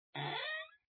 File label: Door Open; papas hot doggeria; android; x68000